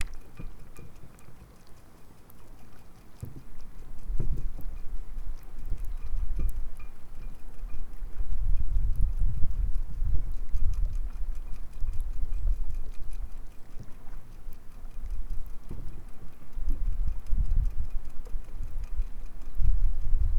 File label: wind by sea